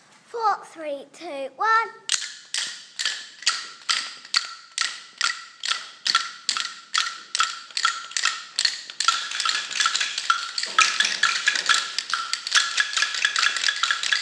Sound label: jelly on a plate pulse rhythm ostinato